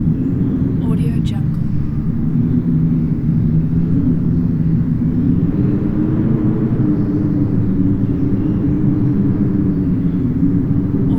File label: space ambience